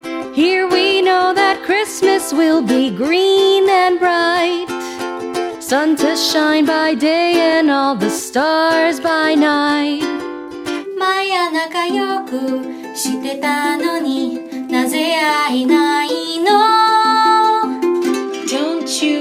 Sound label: Jingles, Singing & Jingles; voiceover